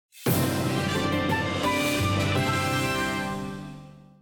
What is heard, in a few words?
Jingle Cog Complete; Thomas & Friends Go Go Thomas